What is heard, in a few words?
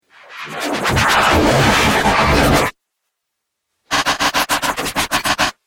Dubstep Scifi Effects